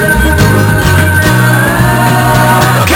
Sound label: dive